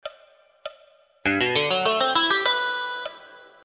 SCALES Terzen

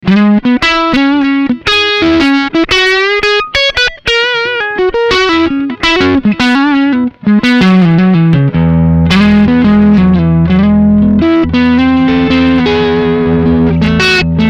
Blues Linesignal

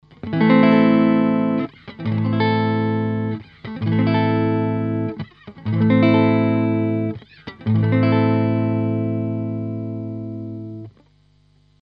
EQ Mitte Strat Hals PU

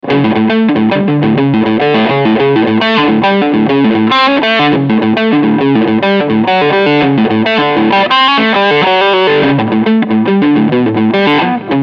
Riff Overdrive interner Pre

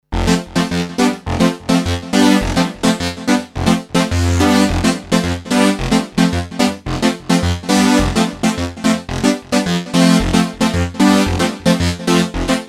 etwas Metallisches

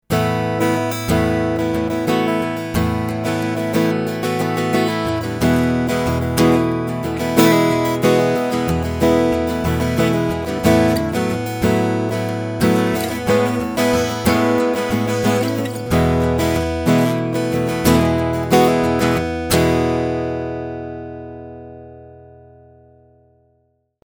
strumming pur; triAxis

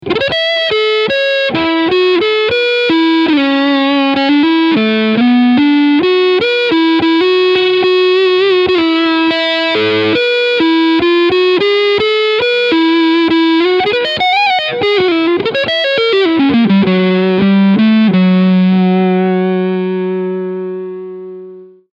Crunchsound beide PUs

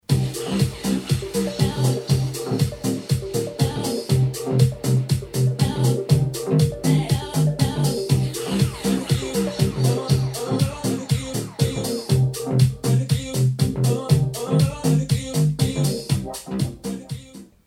Musik 80 cm XY Mikros, Tascam DR40

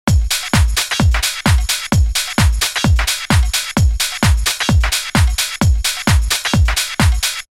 Loop mit Renaissance EQ

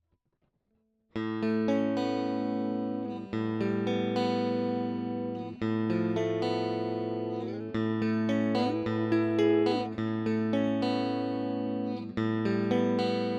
TDI4 MKII ohne Effekt

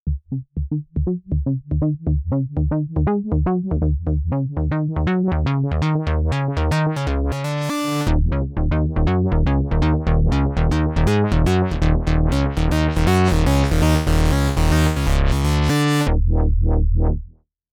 Lang PEQ 2 Synth ohne EQ

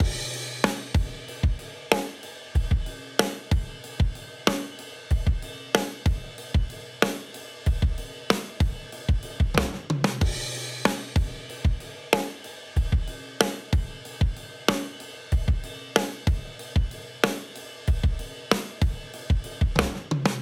Akustik Set VSC 3 8 1 Ratio 05ms Attack 01ms Release 10 dB GR Peak Stereo SC SC Filter Off

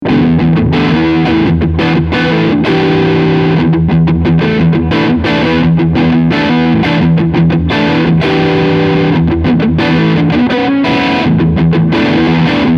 Crunch beide PUs

